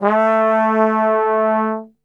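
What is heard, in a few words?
A 2 trombone glissando, Brass Super Section; Best Service; TRMB GLISS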